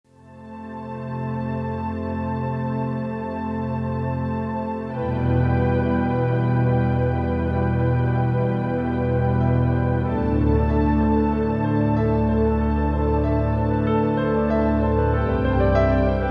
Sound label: Underwater - Ray to Whales Swimming